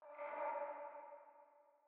Vox

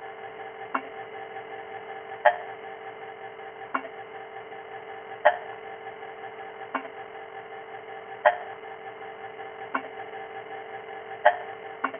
80 BPM lab perc loop

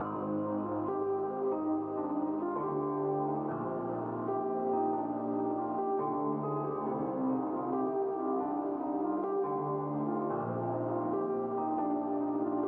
Melodic Piano

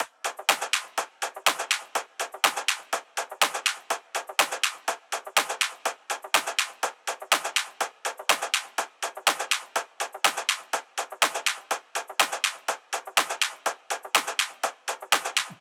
123 Top Loop

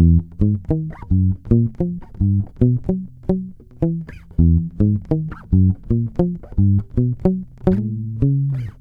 bass guitar loop unprocessed mute 109 B

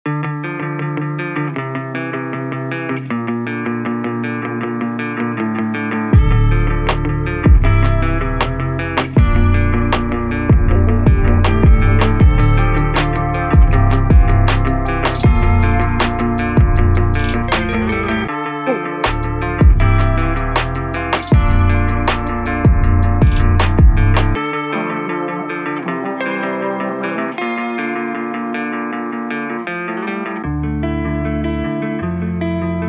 Emo Rap

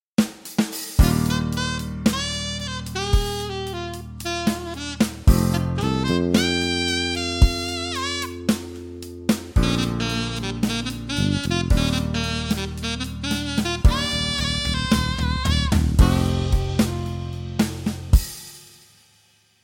Sax; Practice Safe Sax

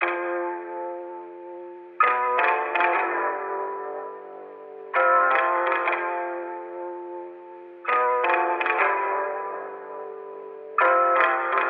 Melody Loop Guitar Fm 82 BPM LoFi Ambient

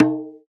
Conga Slap

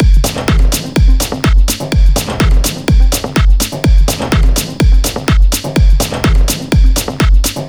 drum, 125

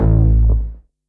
bass one shot shifty A; CAPTAIN CUTS

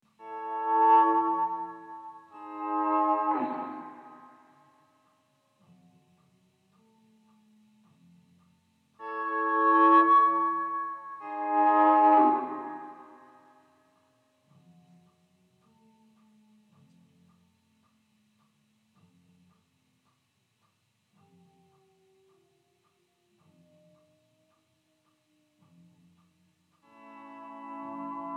Guitar

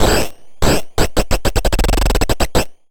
Noise Granules

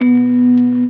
Dusty Drone

